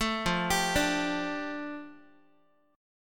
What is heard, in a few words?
F 6th Add 9th F down Guitar, chord; F6add9 arpeggiated